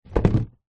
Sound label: foley cardboard box fast food empty set down table